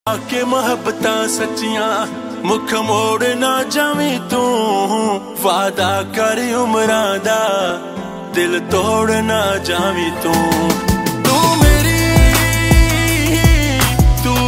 New Punjabi Song

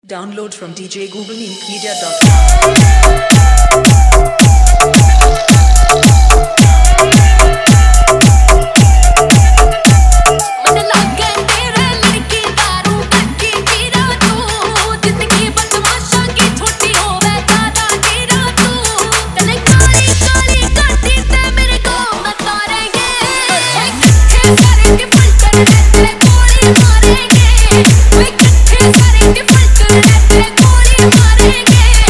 Siren Remix

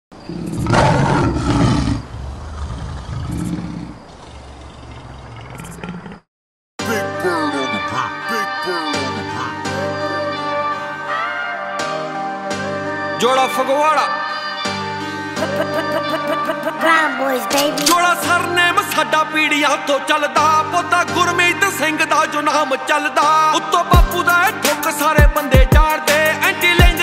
New Punjabi Dj Song

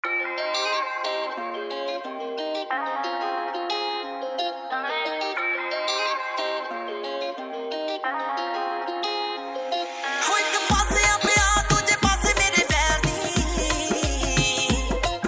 Dhol Remix Song

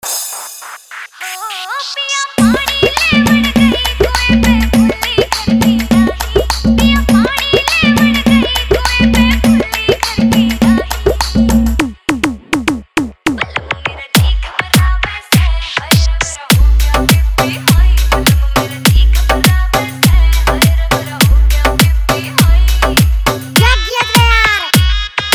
Hard Bass Remix Song

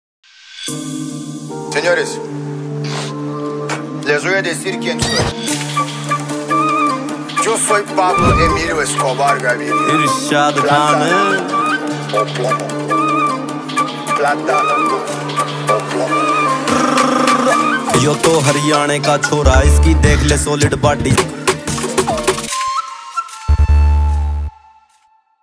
New Haryanvi Song

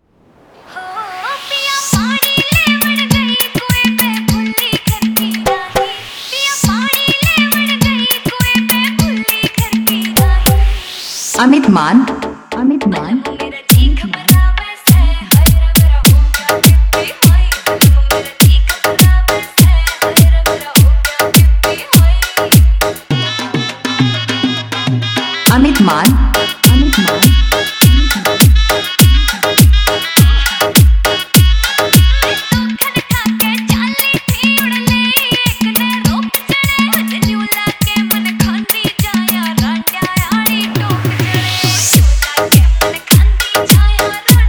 Hard Bass Remix Song

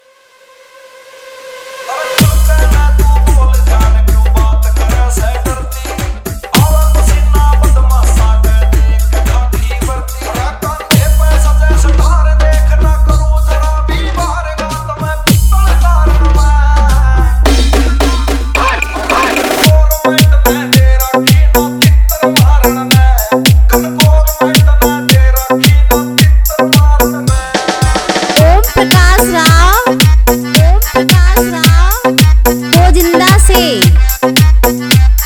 Dj Remix Hard Bass Haryanvi Song

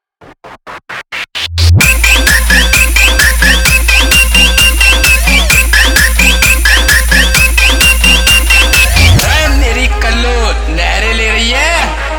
Edm Remix

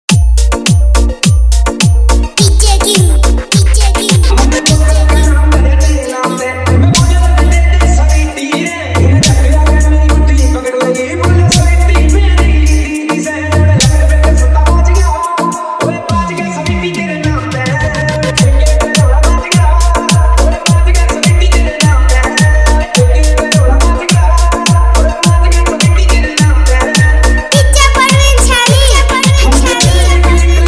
Dj Remix High Bass Vibration

